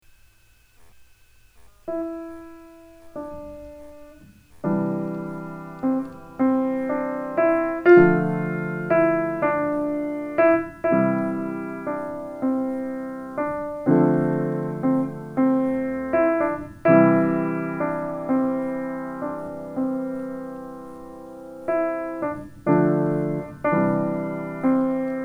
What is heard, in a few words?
piano impro